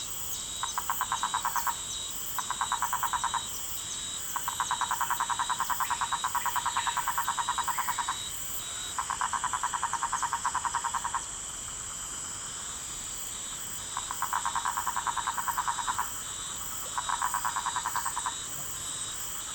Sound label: Rhinella lescurei